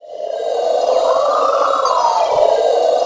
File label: Flowers; Flowers appearing around Tingle (last 96,292 bytes loop)